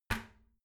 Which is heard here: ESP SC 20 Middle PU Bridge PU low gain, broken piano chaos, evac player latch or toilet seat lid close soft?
toilet seat lid close soft